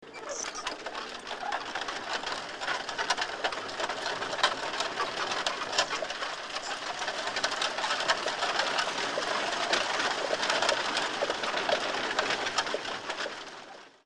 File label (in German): Kutsche faehrt ab